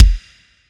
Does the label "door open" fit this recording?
No